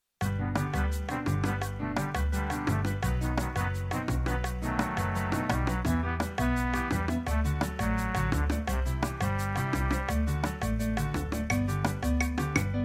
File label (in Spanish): Salsa